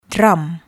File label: drum